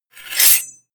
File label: scrape